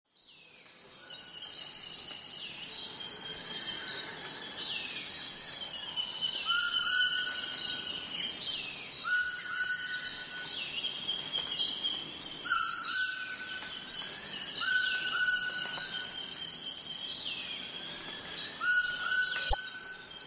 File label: Nature Life Forest In Amazon